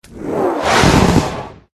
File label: Pet Lizard Buff; varan